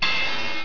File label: clash